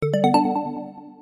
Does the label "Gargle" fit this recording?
No